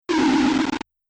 Short Laser Interference; Laser Sound Effect; Sci-Fi Laser Sound Effect

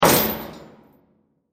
Metal Chain Impact; Miscellaneous Impact; Impacts; Crafted Signals

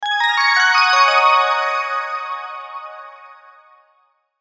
Logo Bells Chimes Swirl Melody; Sonic Logo Sound Effects; Production Element Imaging Element Accent Transition

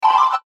CHIME WHOOSH, Chime Whoosh Electronic Digital; Chime Whoosh Electronic Digital Production Element Imaging Element Accent Transition; Multimedia; Blastwave

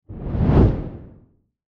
Fireball Burst, Fire Burst Flame Blast; Flames; Sound Design; Flame Sound Effects

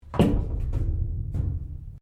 Door Pivot Interior Metal Cabinet Open; Various doors; Miscellaneous Doors

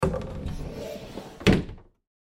Closet Door Bi-fold, Closet Door Bi-Fold Open; Closet Doors; Household; Blastwave

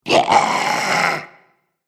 Zombie Frustrated; Zomby Sound Effects; horror sound effects

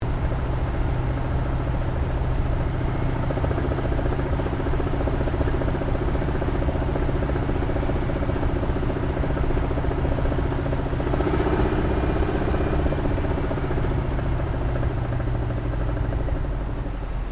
AIRCRAFT CAC WIRRAWAY APPROACH INTERIOR; CA-16 Wirraway; Airplanes; Vehicles

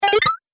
Game Turn On Machine, video game

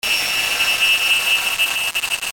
Distortion Stutter High Pitch; Blastwave

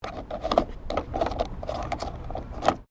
Car Ford Focus Fuel Cap Open

